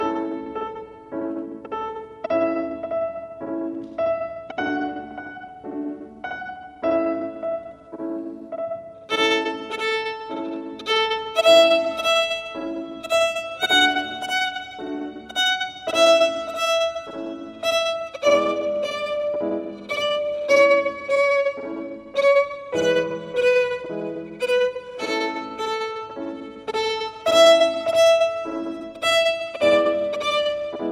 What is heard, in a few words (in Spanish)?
Ritmo Muy Lento; Twinkle